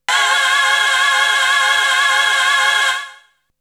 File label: Melody oneshot; ZERO G - Datafile DISC 2